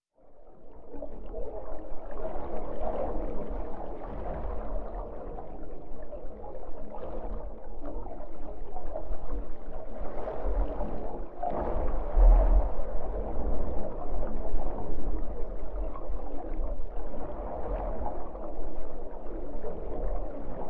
waves splashing boat high pitched diffused muddy sea ocean underwater boat ride; designed; sonniss gdc